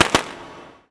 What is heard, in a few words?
firecrackers; dota